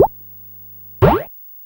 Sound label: talam; m8